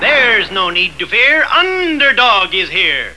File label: Battlecry